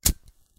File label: lighter; Items